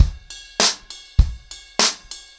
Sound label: 100BPM, ACOUSTIC DRUMS